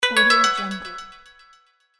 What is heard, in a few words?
peaceful koto echo alert